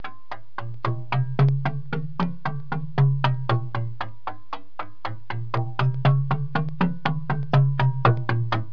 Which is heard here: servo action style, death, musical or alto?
musical